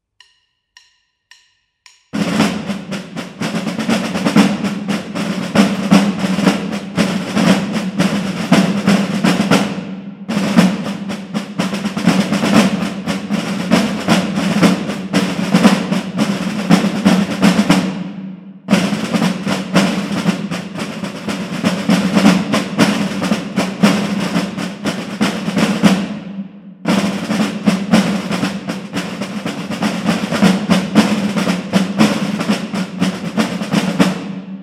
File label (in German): Tambouren